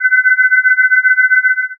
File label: forcefield